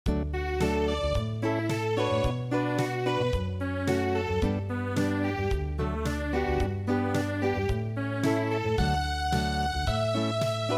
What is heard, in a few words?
in D Unplugged